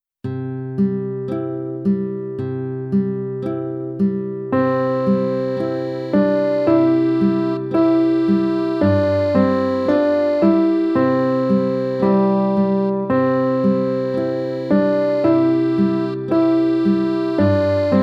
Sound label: Alouette Slow